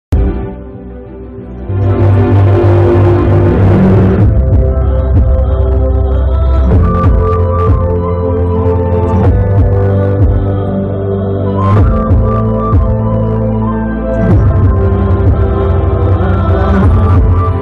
climax bgm ringtone; premalu movie

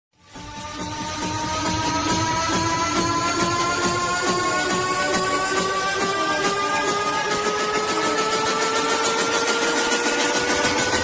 lord shiva bgm ringtone bgm tamil

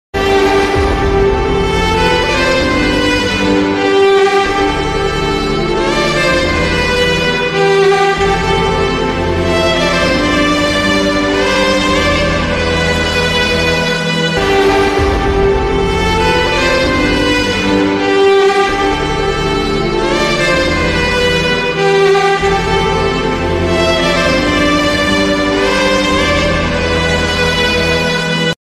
reprise ringtone slowed reverbed music

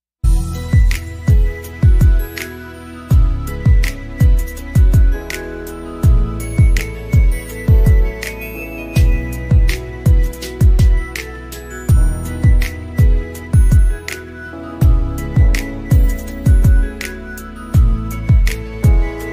flute ringtone instrumental ringtone; tiktok trend

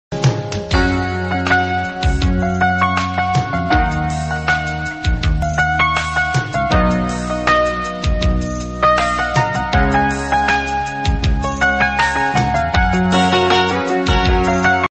tune ringtone; dildostidance; swayam; shantanumaheshwari

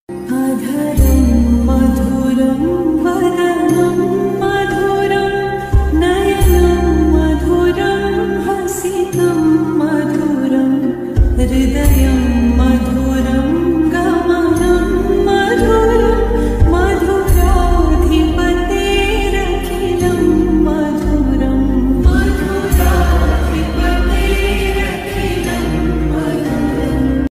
adharam madhuram song lofi song

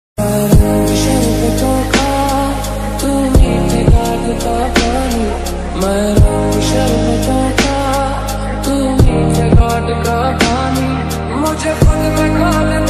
ringtone lofi remix ringtone

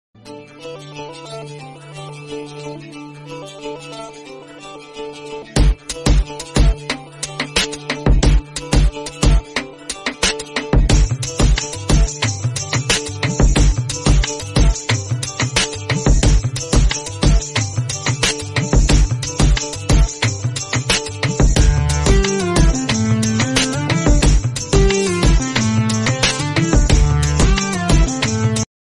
telugu background music; bujjigadu; prabhas; trisha

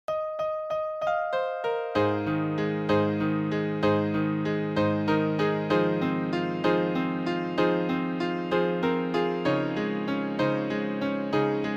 piano ringtone piano cover ringtone

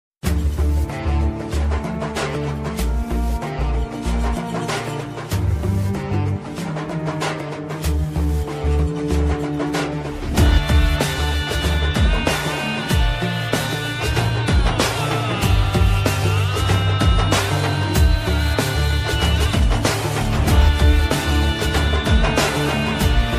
movie bgm; chiranjeevi; raraju; chiru 153

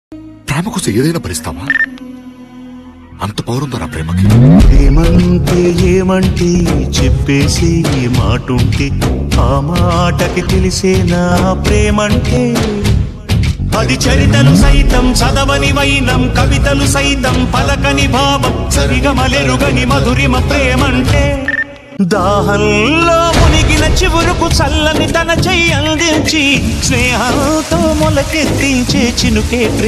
telugu song; nuvvosthanante nenodhantaana

